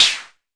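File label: mbrute snare, Arturia MiniBrute; DRUM MACHINES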